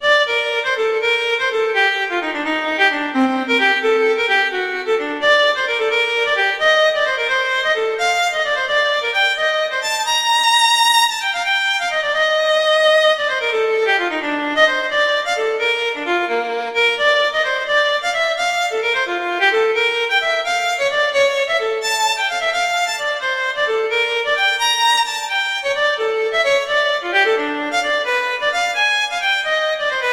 Gigue; Bach; Suite 5

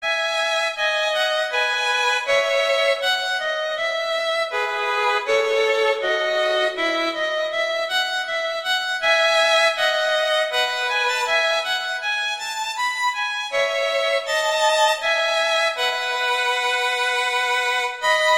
Menuet; Bach; Partita

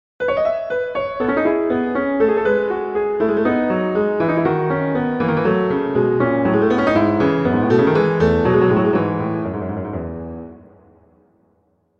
Prelude - E major; Clementi; Preludes

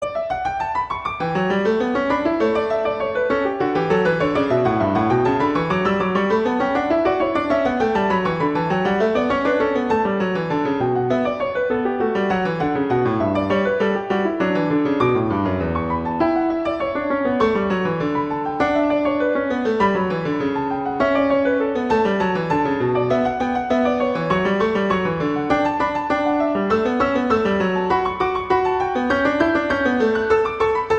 Exercise - D major; Clementi; Preludes

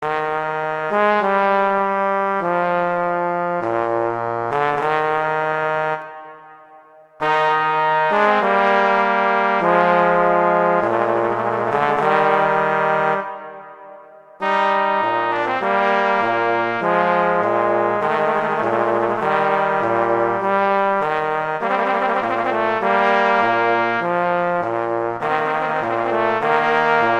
Largo; Telemann; Canonic; Sonata 6

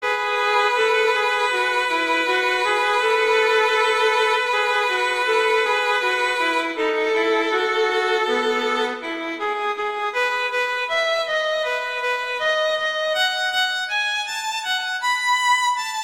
Menuet; Bach; Partita

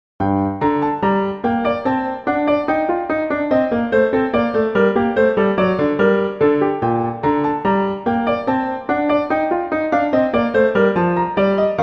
Gavotte; Bach; Eng Suites; Suite III

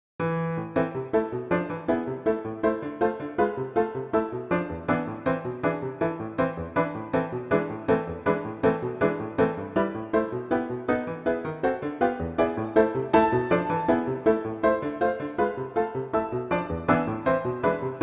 Etude, Agitato; Chopin; Etudes

